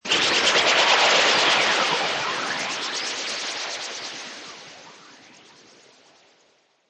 Magical Sweeper Swirl Flange; Magic sound of casting spell, conjuring or wizardry. Witchcraft ritual summoning elemental

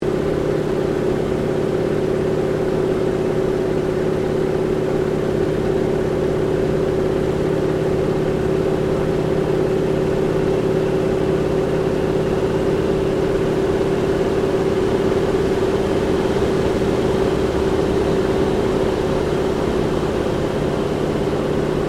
AIRCRAFT CA-28 CERES APPROACH INTERIOR; Airplanes; Vehicles

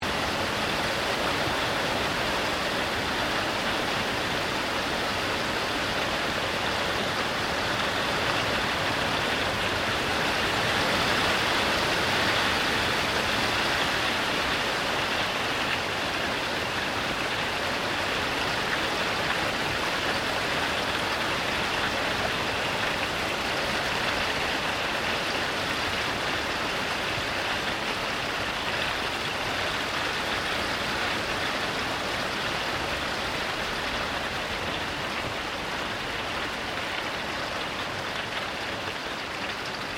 RAIN TORRENTIAL SURGE FADE; Elemental weather effects

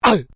MALE VOCALIZATION UGH; Exclamations; Voice

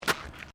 Footsteps Concrete Step; Crocs; Footstep Foley; Footsteps (Sound Effects:Human)

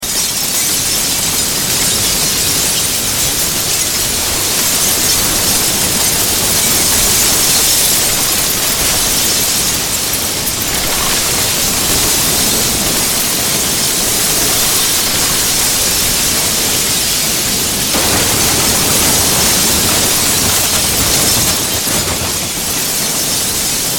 Impact Crash Mass Destruction Glass; Crashes; Objects

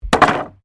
Wood Movement Heavy

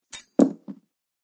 Coins Impact; Money Sound Effects

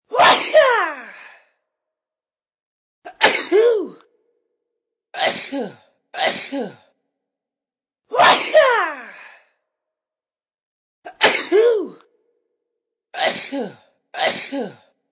Sneezing Ringtone; Human Vocal Ringtone; Human Vocal Sound Effects; Ringtones

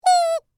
Squeaky Toy Sad, Single; Foley; Miscellaneous Toy; BlastWave

